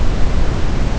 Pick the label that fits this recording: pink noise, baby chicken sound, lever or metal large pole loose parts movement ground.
pink noise